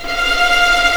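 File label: F5 tremolo p violin left, violins tremolo; East West; The Ultimate String Collection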